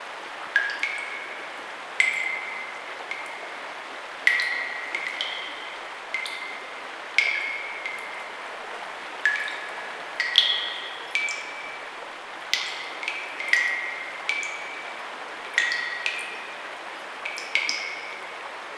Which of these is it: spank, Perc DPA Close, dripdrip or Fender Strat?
dripdrip